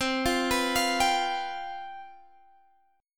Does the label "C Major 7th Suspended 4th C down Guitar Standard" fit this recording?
Yes